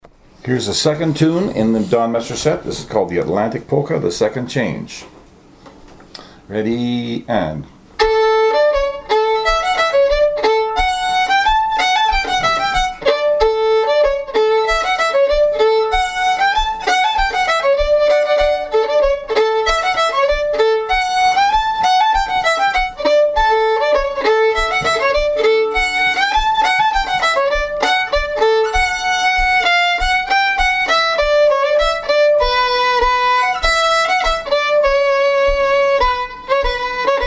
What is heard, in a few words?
polka, violin